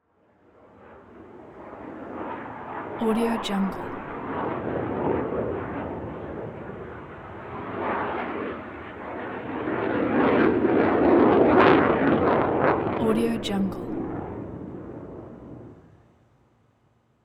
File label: jet pass by; blast drive 30; prosoundfx